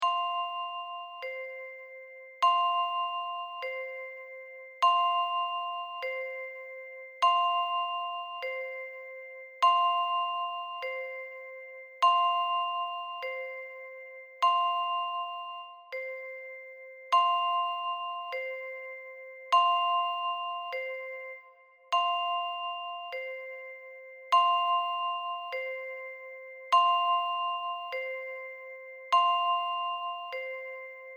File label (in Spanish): Din don Metal